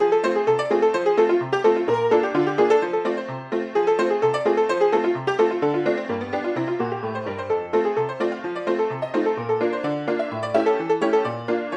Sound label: Math Bg Old Ragtime Movie Theme; Bubbu School; Android